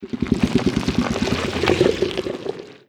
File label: idle subtle, barnacle; Half Life Alyx